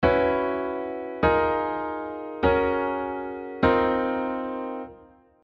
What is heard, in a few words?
quartal 7; quartal harmony